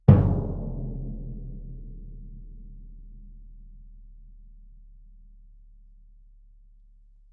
bass drum fff; Struck Membranophones; Bass Drum 3

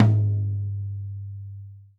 Tom2 Soft; hydrogen drum samples

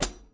Hat Pedal Med; hydrogen drum samples

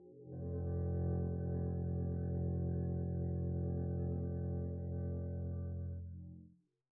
arco f1 pp up, double bass; dsmolken

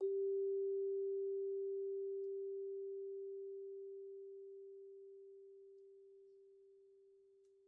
Vibes soft G3, Vibraphone, Soft Mallets; Struck Idiophones

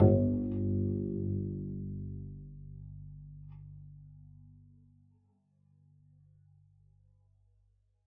pizz g1, double bass; dsmolken

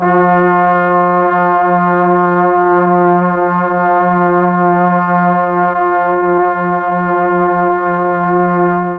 F3 1 2 BRASS, mellotron

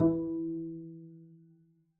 pizz e3, double bass; dsmolken

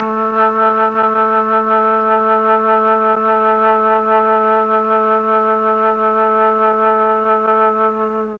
A1 mellotron flute

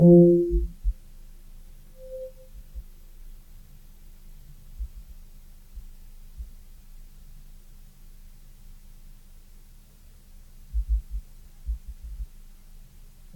Grand Piano, Kawai, Sustains, F2; Chordophones

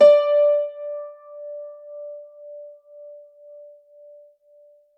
Grand Piano, Kawai, Sustains, D4; Chordophones; Legacy

